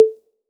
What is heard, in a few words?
Tom Donkey Conga, 909 Toms; Slate; Vintage Drum Machines Volume 3 909 Edition